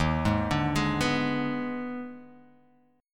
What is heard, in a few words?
D sharp Major 7th Suspended 4th Sharp 5th D sharp Guitar Open F, chord; arpeggiated; 3,3,2,3,2,x; JGuitar